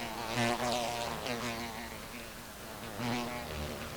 fly

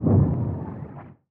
distant explosion

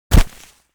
newspaper drop

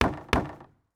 wood knock

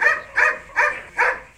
dog bark

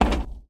wood stomp

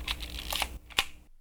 35mm camera shutter

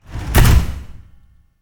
fiberglass door slam

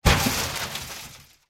car hit

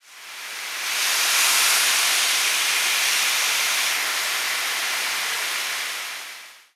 steam release

